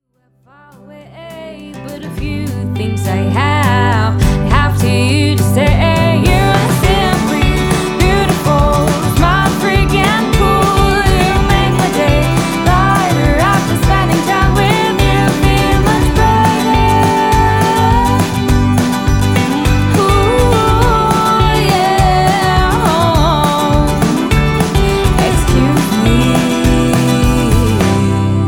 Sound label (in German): Ukulele lovesong